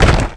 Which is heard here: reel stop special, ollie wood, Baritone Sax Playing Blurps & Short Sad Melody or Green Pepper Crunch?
ollie wood